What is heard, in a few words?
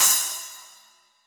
crash cymbal one shot f sharp key